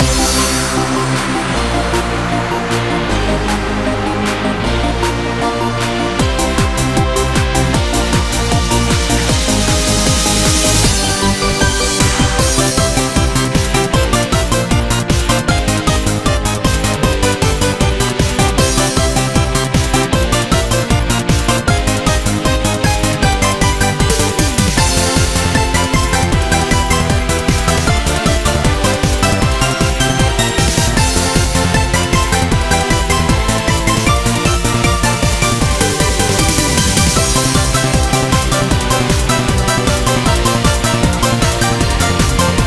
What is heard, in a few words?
Chiptune; dopamix 3ds gamerip 2012